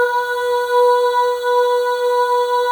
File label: AH, FEM; Spectrasonics; FEM.2 AHS